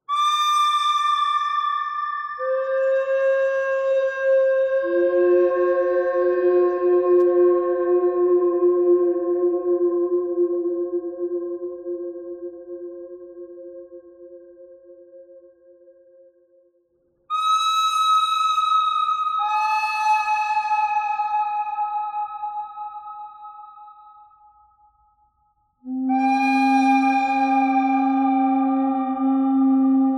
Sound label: bird thread harmonic